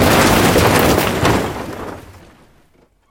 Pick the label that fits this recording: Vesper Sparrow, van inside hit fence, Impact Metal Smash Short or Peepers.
van inside hit fence